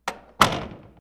door close; Golden Crayon Sounds

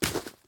grass step; Golden Crayon Sounds

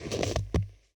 snow step; Golden Crayon Sounds

